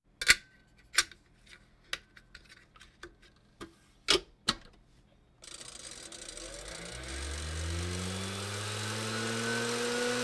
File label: Hospital effects